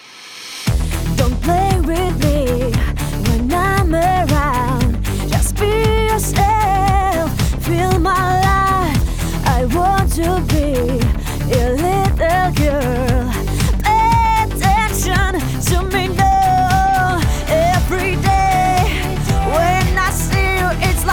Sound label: vox woman in mix before after